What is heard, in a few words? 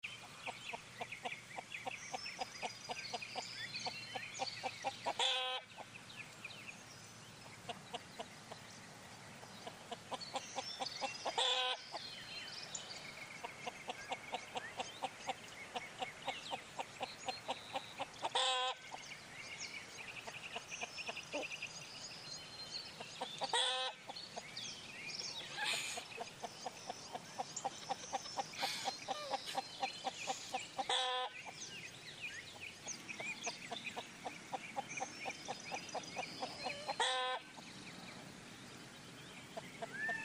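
village sounds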